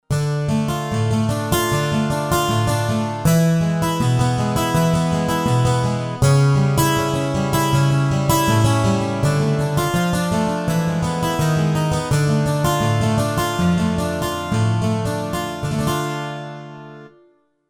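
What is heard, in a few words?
Guitar 18 String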